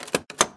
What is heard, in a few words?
lever